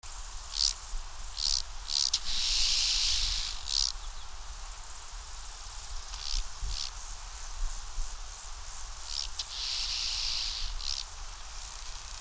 Arcyptera fusca, Hintergrund Psophus; Thermenlinie

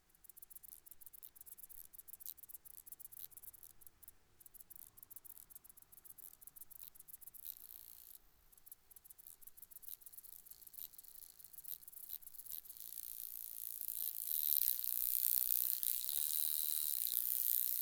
Stenobothrus rubicundulus Balz Flugschnarren, Piestingtal

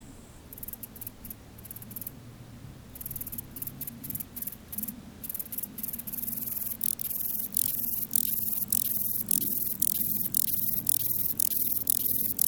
Stauroderus scalaris Vers; WSchweighofer

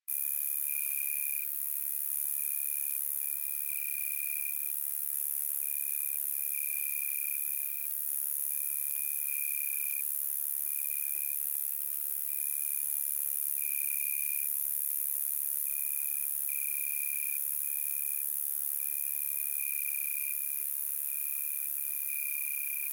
Ruspolia nitidula, Hintergrund Oecanthus; FeuchteEbene

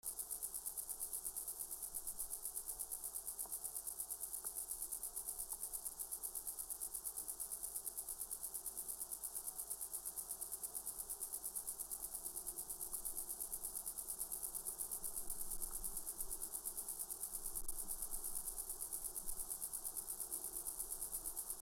Stenobothrus lineatus Balz, Thermenlinie

